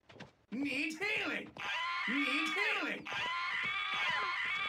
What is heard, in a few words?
vhs